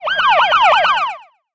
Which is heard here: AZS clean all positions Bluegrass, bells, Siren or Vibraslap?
Siren